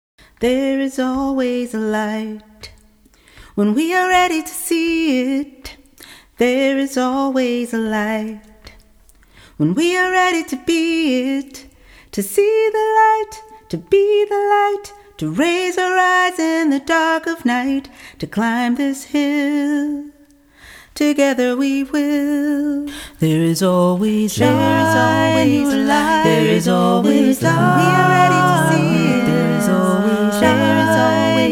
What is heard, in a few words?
Be The Light All